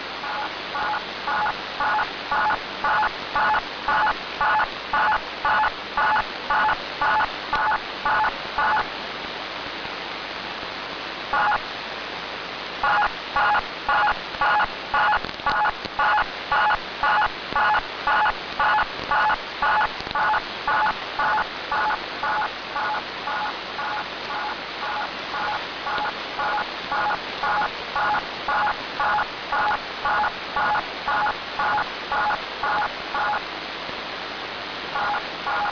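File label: 11447 kHz USB unidentified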